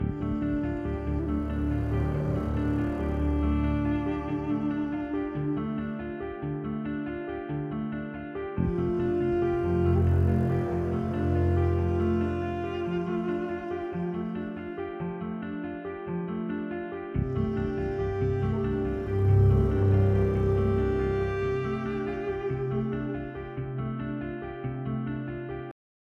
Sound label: Exp Travelling Loop 140bpm 5-4 12bar; gamerip